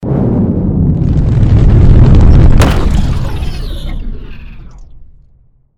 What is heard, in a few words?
core Land; Mindustry